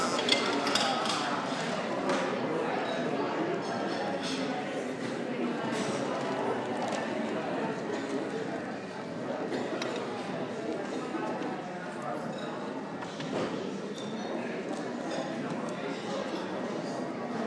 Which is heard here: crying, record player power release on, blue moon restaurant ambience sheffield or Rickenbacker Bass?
blue moon restaurant ambience sheffield